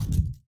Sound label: Hand Paddle Attack Med